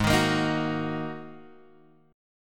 G sharp 7th Suspended 2nd G sharp, down, Guitar, Open G